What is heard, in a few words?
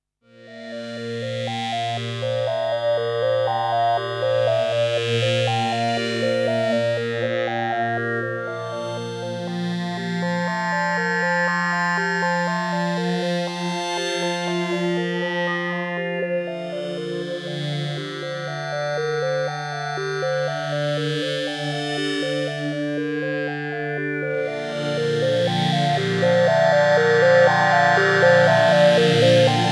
ASM Hydrasynth Mix Sequence